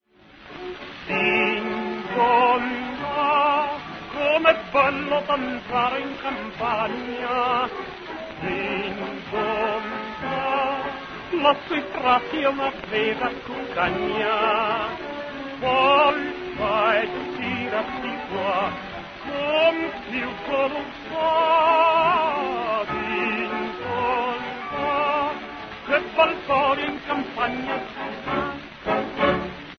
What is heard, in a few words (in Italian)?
Valzer delle campane